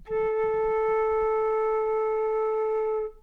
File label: flute low A